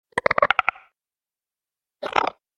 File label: Strider; استرایدر در ماینکرافت